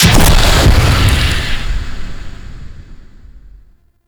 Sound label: Void Dragon Firebreath Cast Ice; Enemies; Abilities; Warframe; Duviri